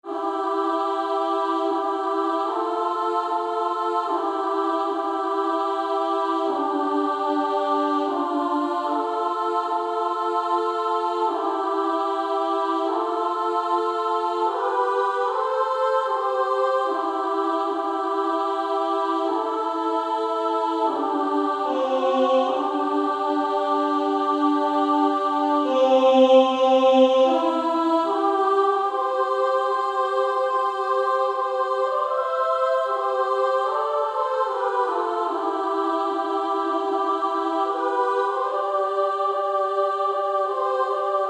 Female Choir SA; Low In The Grave He Lay

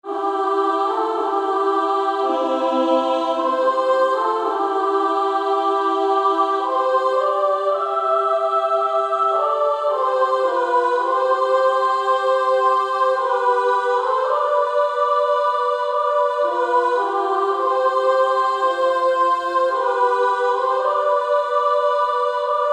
Female Choir SA

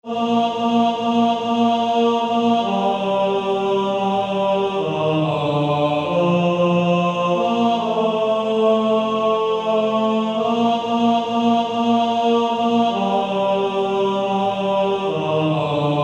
Male Choir; Only a step to Jesus

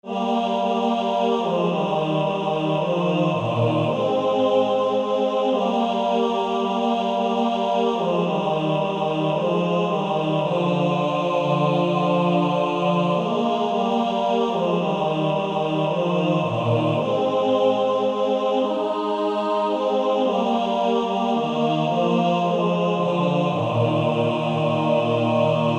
Male Choir TB; He Hideth My Soul

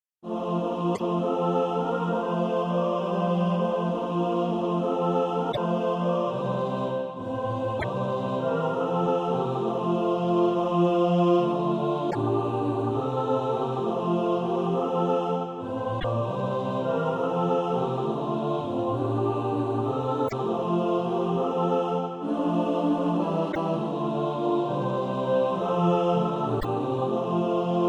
mixed

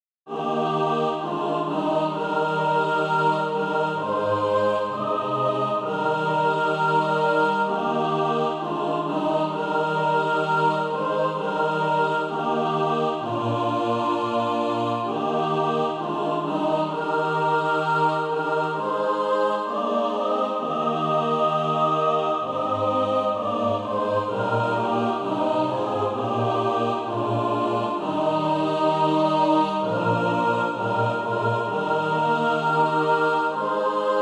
mixed

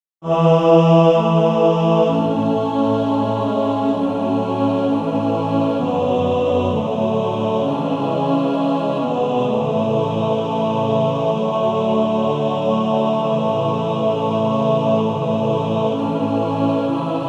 Male Choir TTBB

